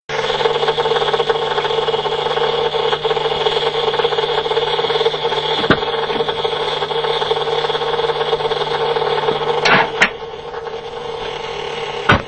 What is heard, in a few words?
tank